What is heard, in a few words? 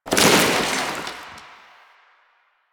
fabric chair destroy; ambience; Warframe; Entrati